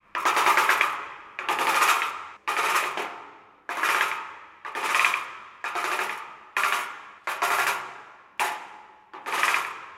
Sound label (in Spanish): palo contra rejas sótano de estadio